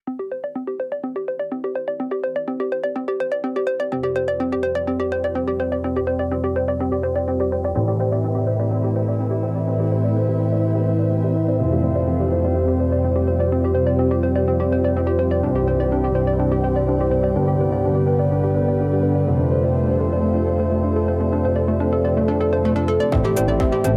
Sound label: Electronic Impulse